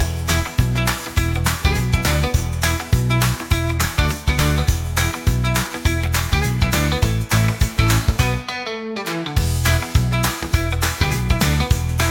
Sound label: Stomp jolly